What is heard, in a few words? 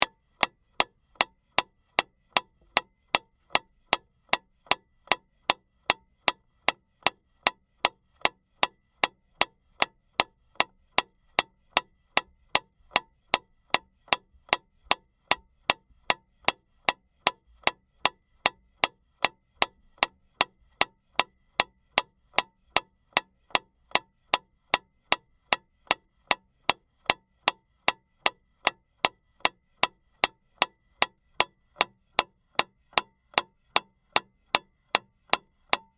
metronome